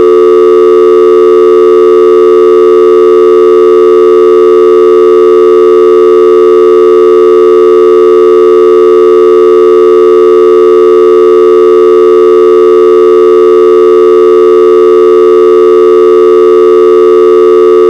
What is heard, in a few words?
Phase And Tuning